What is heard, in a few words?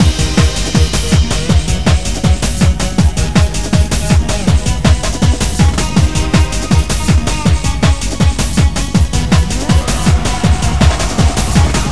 song; svencoop; sc disco